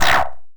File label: Error